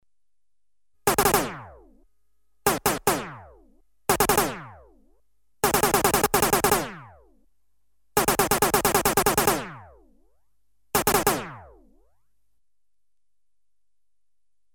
Variable velocity gun (series of bursts), Electronically Generated Sounds; Sound Effects Library